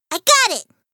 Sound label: battlecry; hat kid; a hat in time